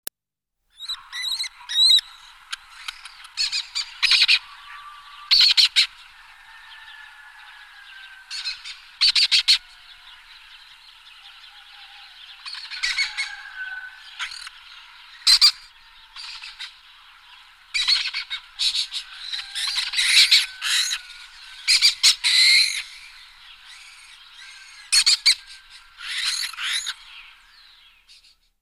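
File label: stepnaya pustelga voice, lesser kestrel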